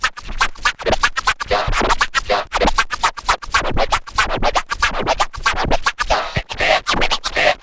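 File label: SCRATCH SEQUENCES; AMG Megabass REMIX! VOL-2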